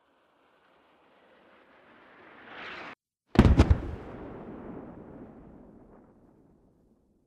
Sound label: mortar impact smoke 100m; gmod; better mortar